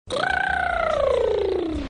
making motor boat noises

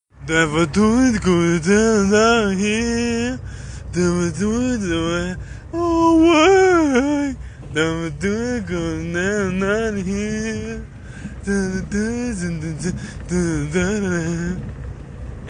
acapella cover

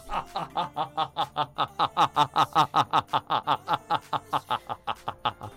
laugh tone

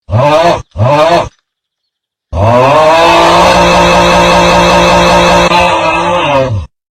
chainsaw auuugh

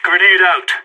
grenade

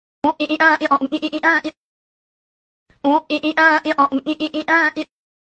oooiiiiaaaa

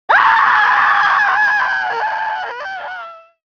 scream female pain

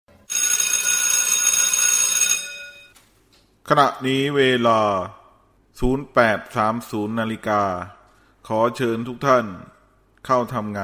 classic ring

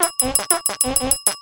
clink fart

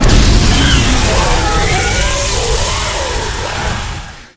roar; laviente

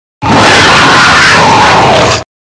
nargacuga roar; monster hunter generations ultimate

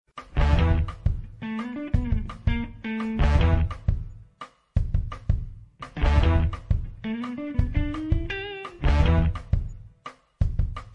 come on down music

